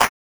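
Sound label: mod snare, Synths and FX, Mod Drums; neuro; Sinistarr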